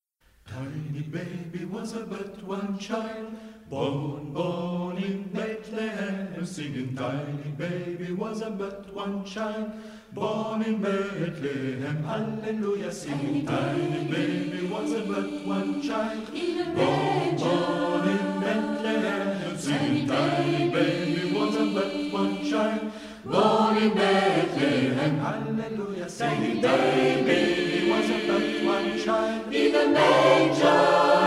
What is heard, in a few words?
spiritual; Bethlehem